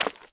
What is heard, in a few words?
flesh